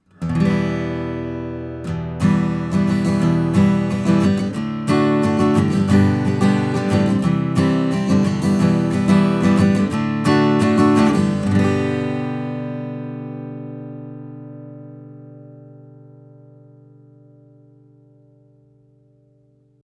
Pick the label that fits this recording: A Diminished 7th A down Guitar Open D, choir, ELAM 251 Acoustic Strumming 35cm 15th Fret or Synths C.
ELAM 251 Acoustic Strumming 35cm 15th Fret